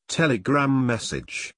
message; Telegram

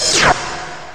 Mechanical sound

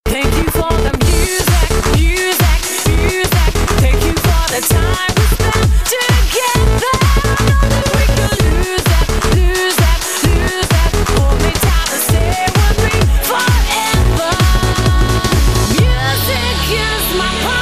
Knob Music

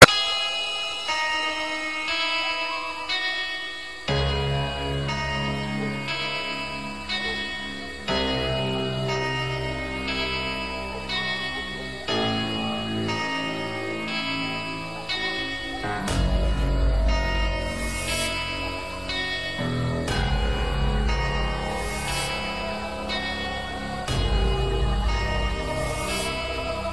Aahat Bgm

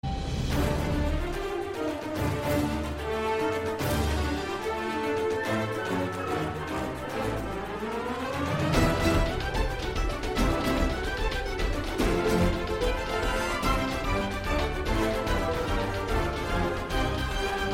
Epic Music Mix

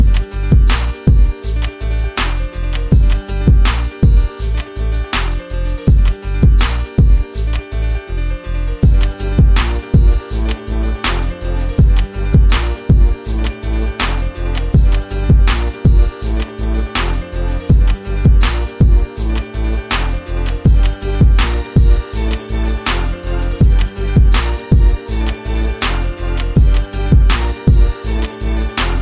Ringtone

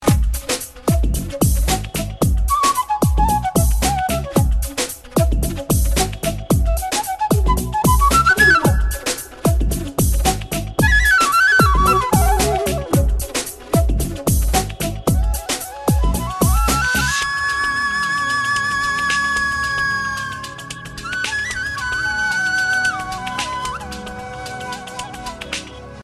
Melody Concert; meloboom

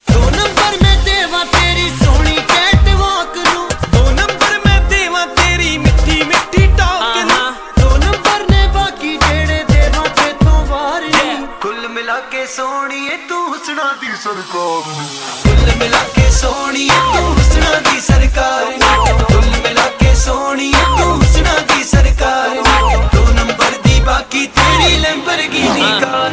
Panjabi Mix

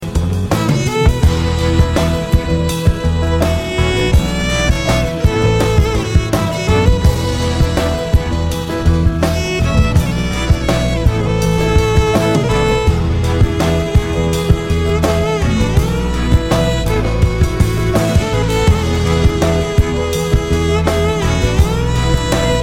Violin Melody

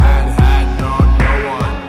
humming

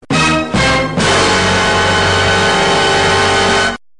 duh duh dun

